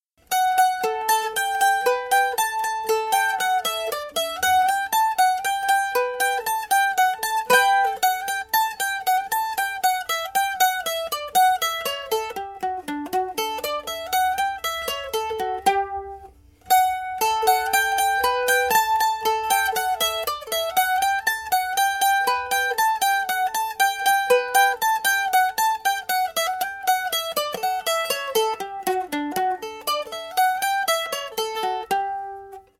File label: banjo